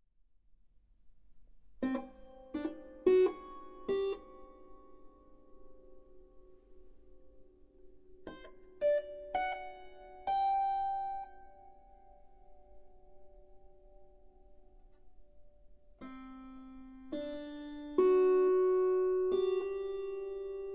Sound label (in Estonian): artikulatsioonid ja summutamine, Kannel; Kandle staccato on oluliselt tervam kui harfil.; Resonabilis